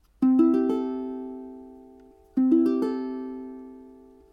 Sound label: chord c6, ukulele